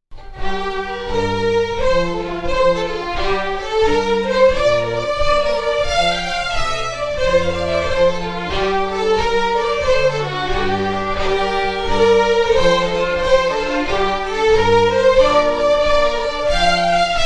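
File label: Jigg, Scottish Fiddling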